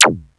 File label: MOD ZAP; Crypton - DrumBase5000